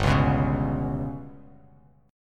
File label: G Major Flat 5th G down Bass Standard, GMb5 chord; GMb5 strummed; JGuitar